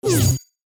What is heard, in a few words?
chime